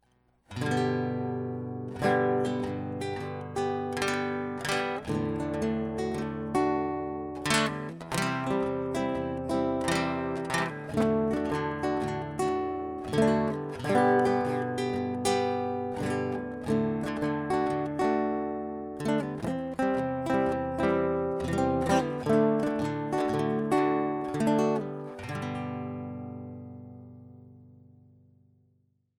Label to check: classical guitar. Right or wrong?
Right